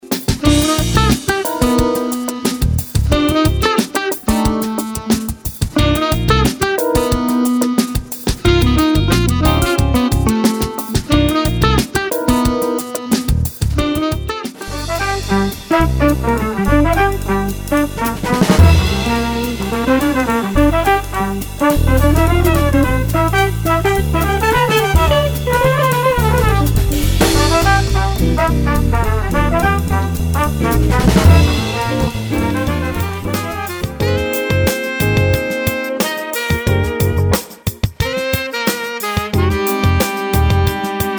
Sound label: Jazz mix